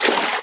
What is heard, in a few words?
water wade, footsteps